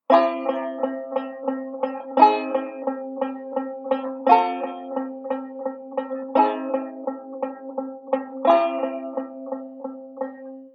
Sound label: C Common Tone Chords, banjo; Beyond Chord Melody Volume II